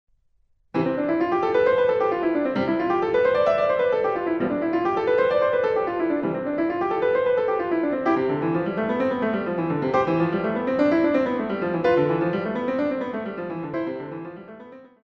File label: c major, classical piano recordings; kohler; op242